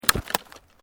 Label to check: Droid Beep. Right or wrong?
Wrong